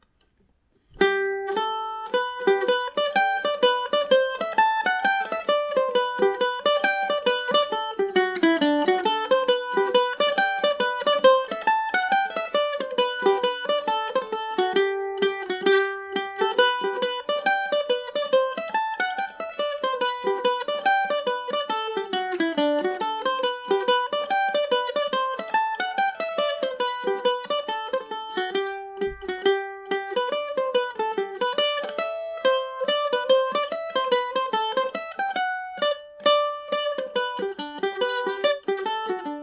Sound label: lurgan hornpipe, mandolin